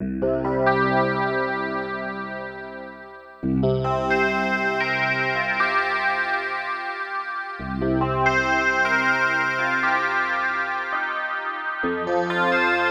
Simple Velocity to Wavescan; Groove Synthesis 3rd

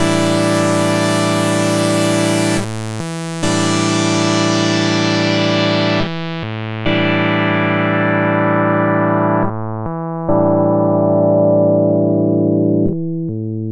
Voice Calibration Round Robin

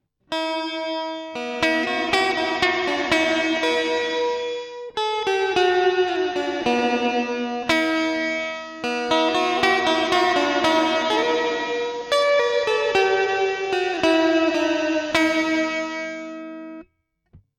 Superego Auto Mode Sitarish

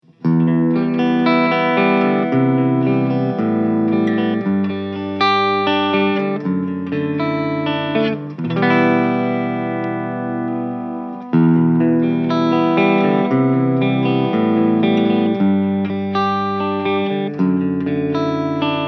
Engl R606 Ironball Head 20 SE Clean

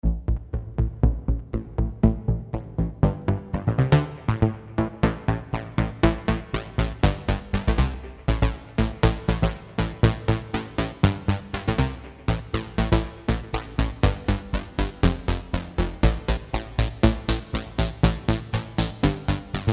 russian filter sequence

